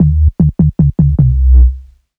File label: Dubplate Bass - 5A; Jungle; Blu Mar Ten; Jungle - 1989 to 1999